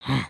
Idle Bobbing; Lego Strombie; creatures organic

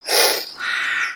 Pet Dragon Happy; creatures; pets; Lego

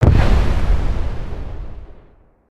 Pillar Impact Long

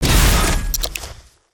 hedgehog smash; destructibles

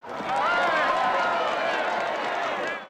Victory Cheers; emotes

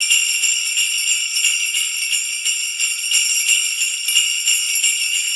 Sleigh Bell Loop; aronwk collection; ndaudio

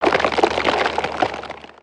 Siege Tower Debris; destructibles

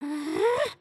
drink

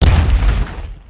terrain blast; creatures organic

